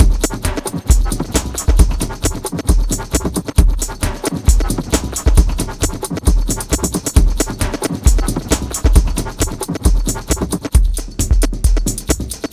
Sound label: Processed Beat, Drums & Percussion